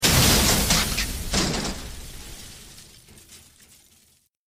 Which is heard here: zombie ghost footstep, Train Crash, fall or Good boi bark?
Train Crash